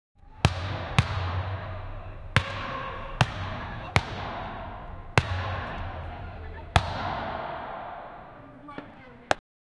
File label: a basketball hits the met